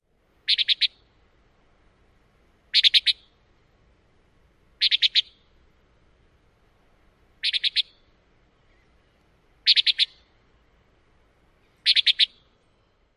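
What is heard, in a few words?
Antillean Nighthawk flight calls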